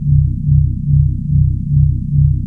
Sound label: pulse